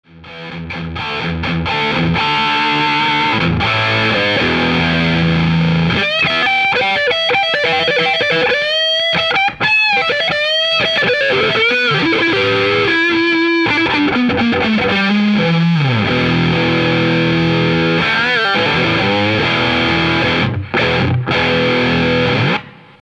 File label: YAMAHA TB-01